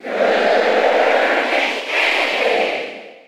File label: Cheer German; King Dedede; SSB4